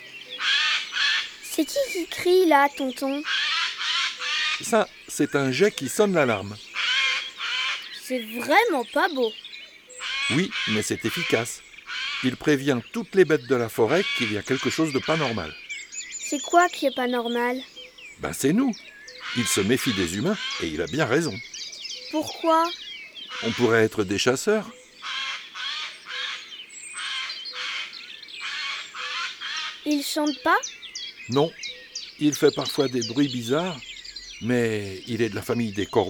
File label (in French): Le geai des chenes; cuicui et compagnie